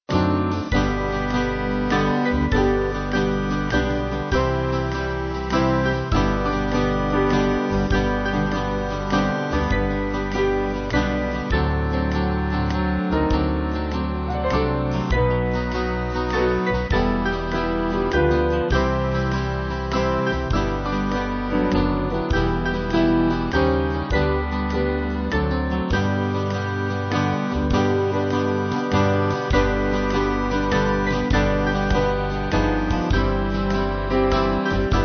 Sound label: band, Music Backing Files for Churches; Do You Know Jesus; Haywood; 5/G-Ab